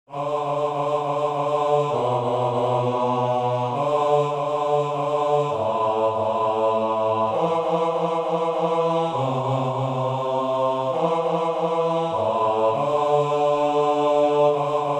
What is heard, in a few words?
Bass